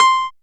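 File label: piano C, House Selection, Dance Synths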